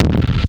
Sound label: DROPFIRE, PHUZZ DRUMS; Spectrasonic Distorted Reality 1